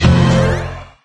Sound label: hovercraft trick start success; There; ThereClient